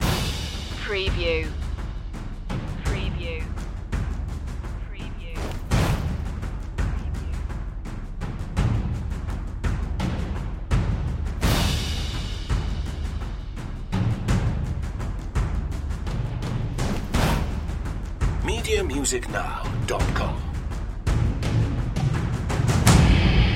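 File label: percussion mix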